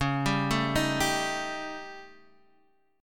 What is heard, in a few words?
Eb 7th Flat 5th Db